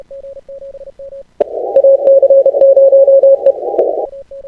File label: Key - Unkey Pop Samples